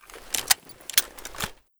groza gl activate, weapons

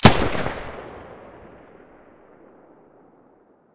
muzzle ump45 stereo, weapons

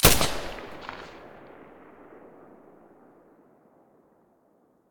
silencer sa80 stereo

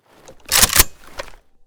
rpk16 misfire

